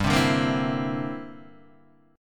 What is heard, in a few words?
G 6th Suspended 4th Sharp 9th, guitar chord; G6sus4#9 strummed; JGuitar